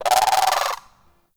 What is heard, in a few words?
Forest Bird Talk; Warframe; Grineer Forest